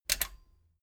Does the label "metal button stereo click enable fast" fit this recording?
Yes